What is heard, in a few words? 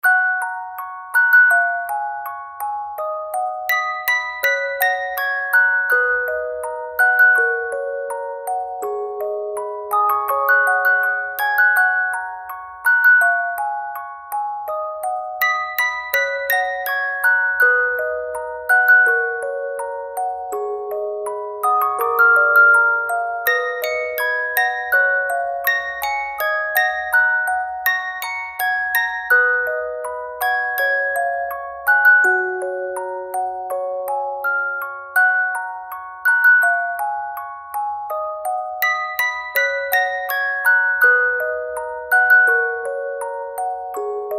Music Box